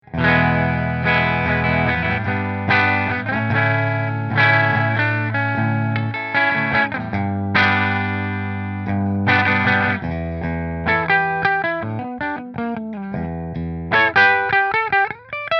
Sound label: Bridge Fender; Heatley